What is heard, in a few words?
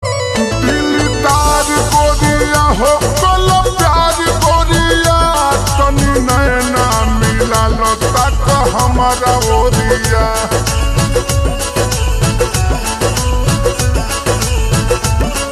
Ringtone slowed and reverb